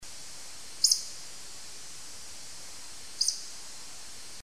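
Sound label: woodcock; valdsh2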